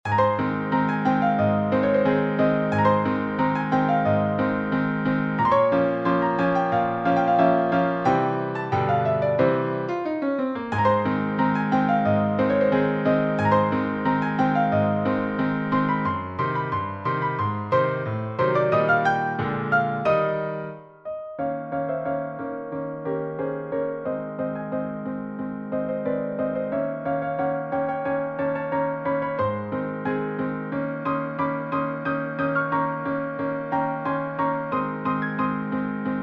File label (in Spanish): Marcha Militar; Piano solo red.